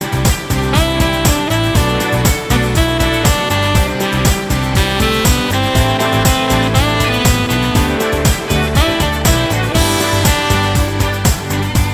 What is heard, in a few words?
Instrumental